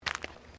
FOOTSTEPS THONGS (FLIPFLOPS) CONCRETE STEP; Footstep Foley; Human

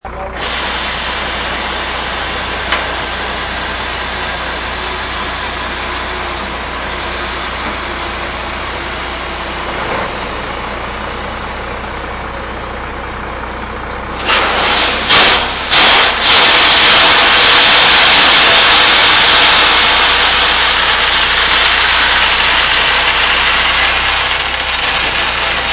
Dump Truck Dump, a dump truck dumping gravel.; High quality audio of a dump truck dumping gravel.; Trucks; Vehicles

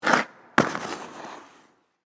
Ice Skates Jump; Sports; Indoor, Ice, Rink; Ice Skating; BlastWave

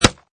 Punch, Fist or foot impact with face; Combat

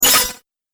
Droid Electro Move, robot; Robot Sound Effects

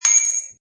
Metal Light ding; Metallic; Multimedia

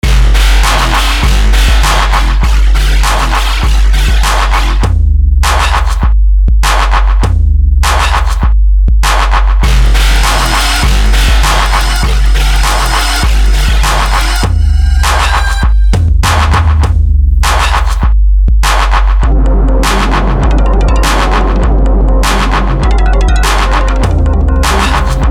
BEAT HIP HOP, Beat Hip Hop Mysterious; Miscellaneous Loops

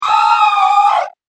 Scream Witch; Screaming Sound Effects

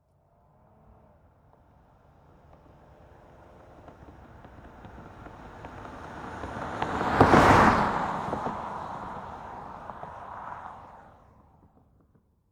Auto Pass By Car Automobile Mono; Transportation; Car Sound Effects; Cars (Sound Effects:Vehicles); SFX Bible 6

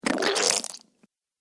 Wiping Slime Ooze Away; Slimy Sound Effects

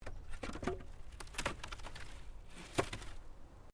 Wood Planks Move

